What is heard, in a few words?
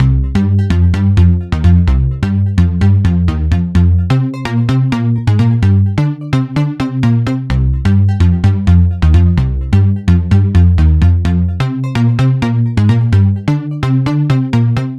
Phat Bass Line Cm 128 Bpm; Axel Wayn